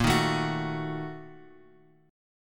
A sharp Major 7th Suspended 4th Sharp 5th, Bass Standard; strummed